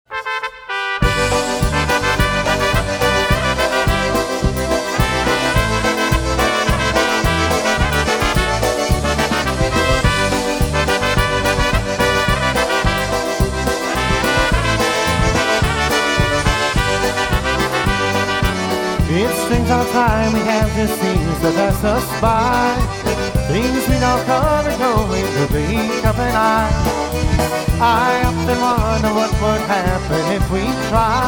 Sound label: What Might Have Been Polka Live Jam